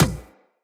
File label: Beach Ball Kick; WaterFight; Beach Ball Floof